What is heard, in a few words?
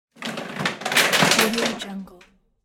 wooden chairs falling